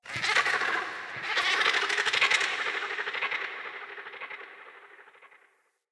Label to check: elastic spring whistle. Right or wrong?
Wrong